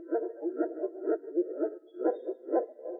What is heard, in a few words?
Moor Frog